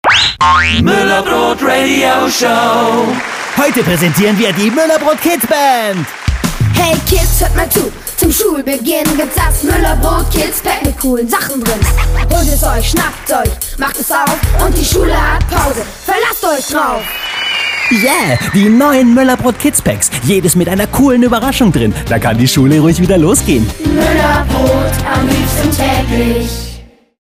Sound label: funkspot